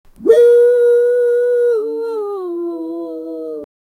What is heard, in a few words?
short and cute, kids